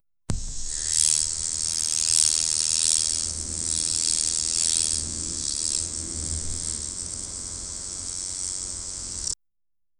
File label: butterfly lands on flower, insects